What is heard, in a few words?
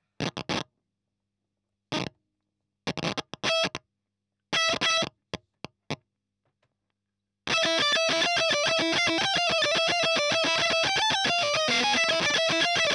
EL Fuzz End